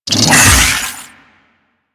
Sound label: Melee Swing; Enemies; Infested Big Ashen; Warframe